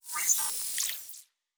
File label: Device Stop, Electric